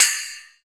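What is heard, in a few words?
PER PROCU, Procussion, percussion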